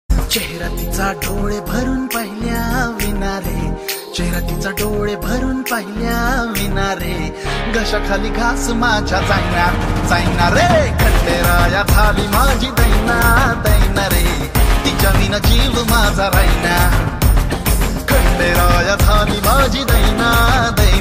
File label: Marathi Movie Ringtone